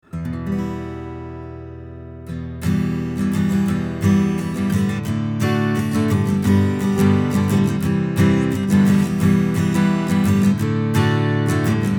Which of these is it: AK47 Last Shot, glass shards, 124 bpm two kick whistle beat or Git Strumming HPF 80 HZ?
Git Strumming HPF 80 HZ